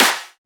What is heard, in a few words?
Clap; FLPRO